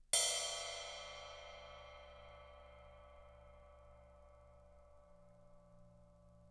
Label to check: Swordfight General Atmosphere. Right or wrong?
Wrong